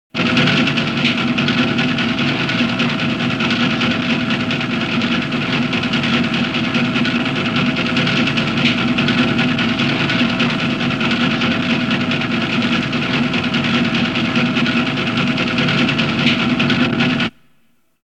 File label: Battle Computer; Enimar 7; Star Trek Original T. V. Series Sound Effects